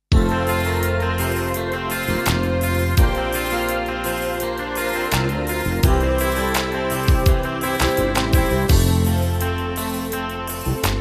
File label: Ballad, Tyros 2; 80ies styles; Back 2 Ballad